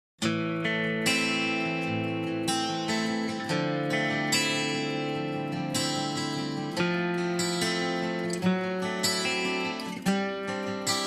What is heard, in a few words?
Romantic Guitar